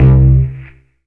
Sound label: BASS Electric String; Polyend; Plughugger